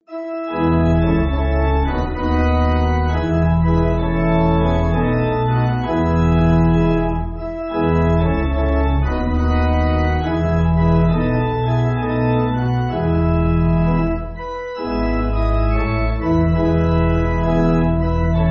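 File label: Pipe Organ; We Are Pilgrims; Bishop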